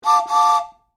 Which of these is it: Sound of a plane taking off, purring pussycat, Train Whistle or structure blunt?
Train Whistle